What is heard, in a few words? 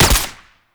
Pistol Shot; Warframe; Weapons; Tenno; Lasrian